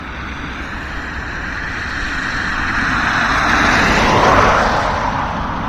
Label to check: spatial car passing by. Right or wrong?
Right